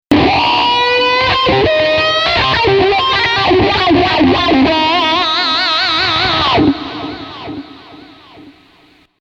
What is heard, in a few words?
Cry Wah; v-wah